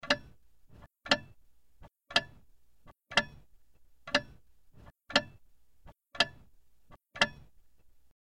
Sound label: old clock ticking